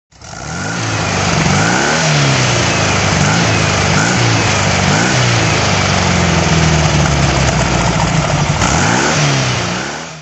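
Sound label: karmann ghia throttle, Engine sound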